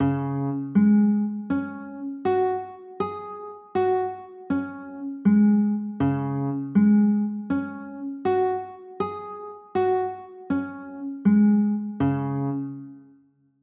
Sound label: d flat sus4, chords